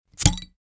bottle open; drinks

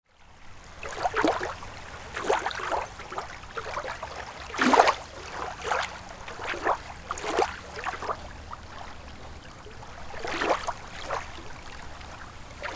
lake waves; nature

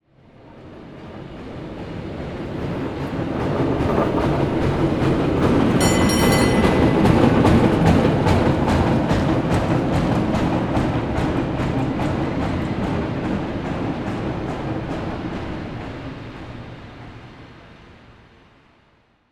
cable car pass by

